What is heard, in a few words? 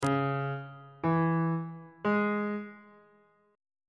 c flat ionian chord 2 is d flat minor